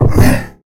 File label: fall